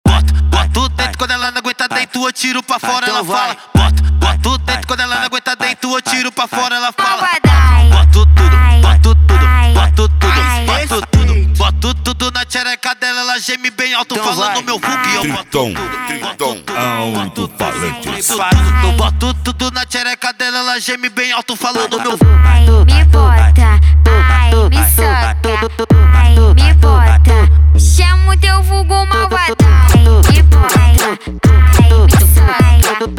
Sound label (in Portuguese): alto falantes especial funk mix; Triton alto Falantes